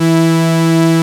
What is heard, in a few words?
Moog modular; Greytsounds VOL-1